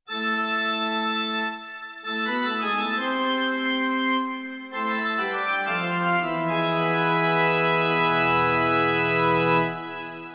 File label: Scary Music; holiday music